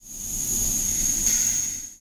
spike swing; hazards; spike ball; fowl-play

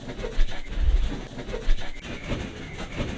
flying still; fowl-play; timmy; enemies

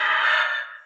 woodpecker; fowl-play; enemies

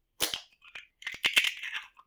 egg cracking; fowl-play; slingshot

